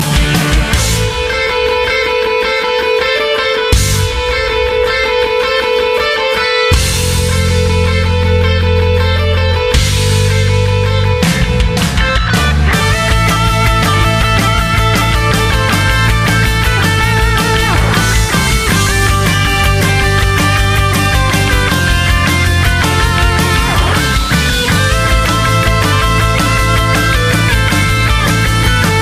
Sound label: guitar; rock